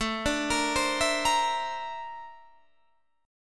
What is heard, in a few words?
Bb Major Sharp 11th A, chord